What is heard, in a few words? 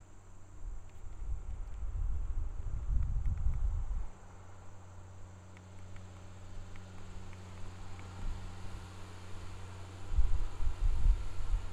Bug Vehicle Wind; fieldrecordings